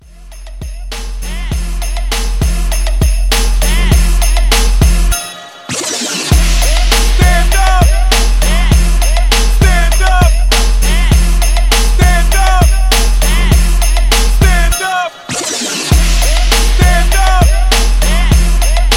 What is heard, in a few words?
Hiphop Mashup; Christmas